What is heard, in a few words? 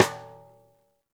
stickshot - snares on; JazzFunkKit